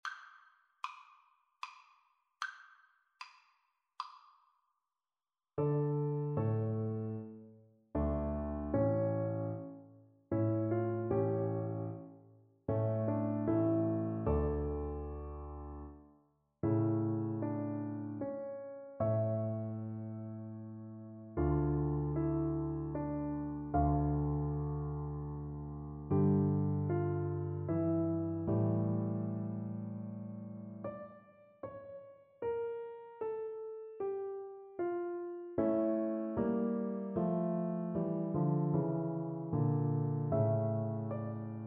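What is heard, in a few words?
vibraphone, percussion; 1stmvt; fourseasons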